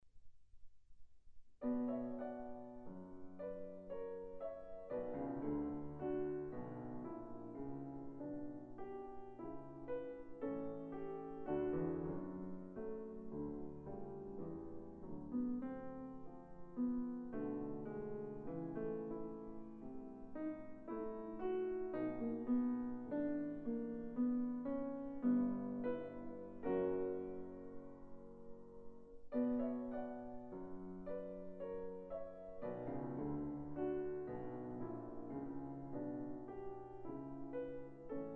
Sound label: Sarabande b minor, piano; Brahms